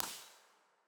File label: Textures, Impulse Responses; 3 Seasons R; Ableton Live 12 Lite; Hybrid